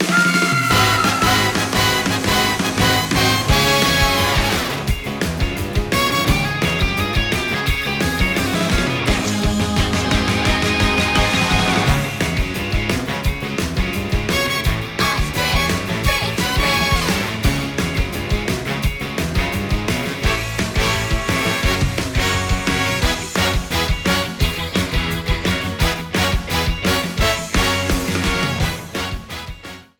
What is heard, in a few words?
Instrumental Theme; DKB; Ostrich Bananza